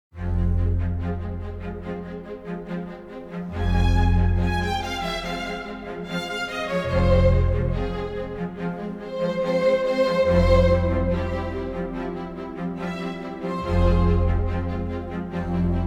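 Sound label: Rondo in F Minor for String Orchestra; Succession Season 2; HBO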